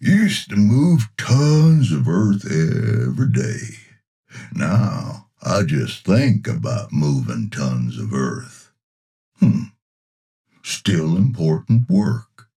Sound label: Excavators, Construction Vehicle; Dopey